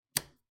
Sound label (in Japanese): 壁のスイッチのカチッ音; switch